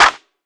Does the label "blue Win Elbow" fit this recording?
No